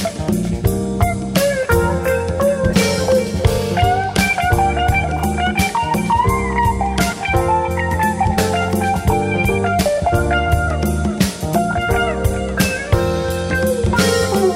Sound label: Funk